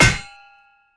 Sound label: Hit Metal Human